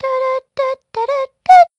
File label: Peach Hum; Paper Mario: The Thousand-Year Door